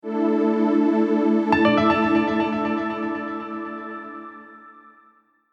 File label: jingle